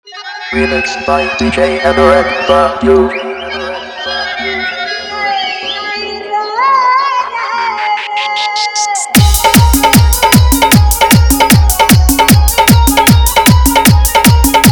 Dhollki Bass Rod Show Garda Dance Mix

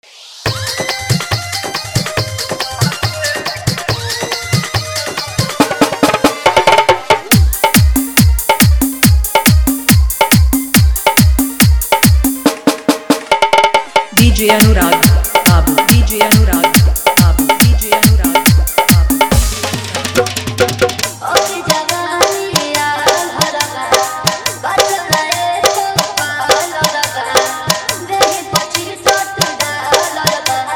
Hard Bass Mix

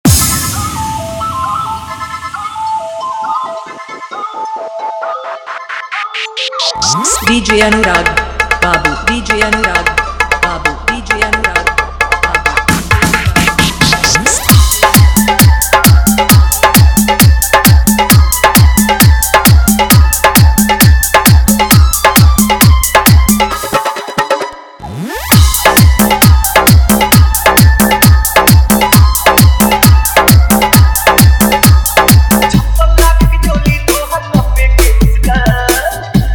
Hard Bass Hi Fi Remix

